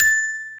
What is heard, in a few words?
normal slider tick